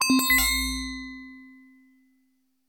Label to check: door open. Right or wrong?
Wrong